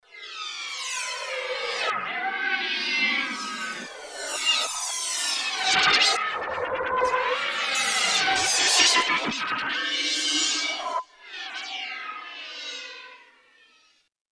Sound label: Rev Cym Bend, Roland JV-880; Factory Patches